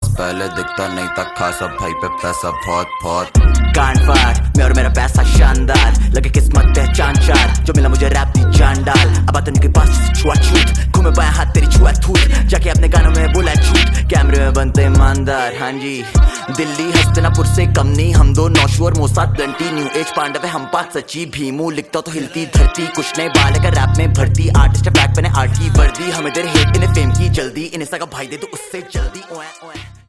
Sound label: RINGTONE, BOLLYWOOD